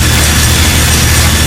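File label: freeze ray, weapons; dn3d r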